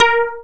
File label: A#, Synclav FM PERCUSSION; Big Fish Audio